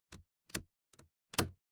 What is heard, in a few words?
door lock